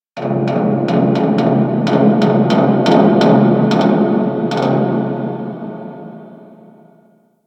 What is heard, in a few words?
Infested Distant Metal Creak, Ambience; Warframe; Corpus Infested